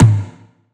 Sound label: tom thin, Drum One Shots; Slate; SD MIDNIGHT Sample Pack